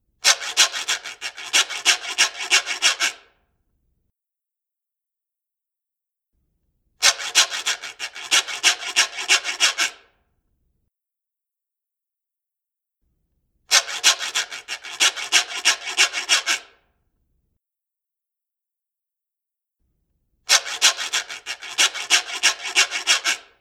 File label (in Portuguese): RECO-RECO reco-reco grave